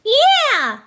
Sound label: yah; daisy; SM64 CO-OP